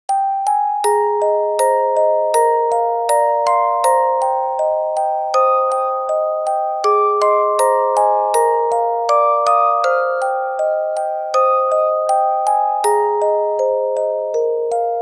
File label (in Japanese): orgel